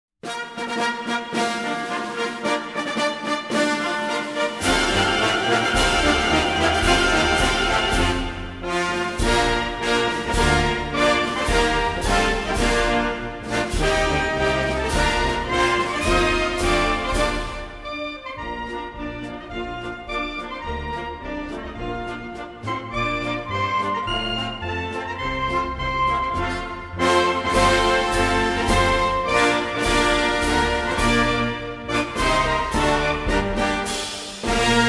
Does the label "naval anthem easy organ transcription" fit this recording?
Yes